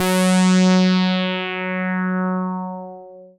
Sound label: Dance Floor Bass, Multisample Basses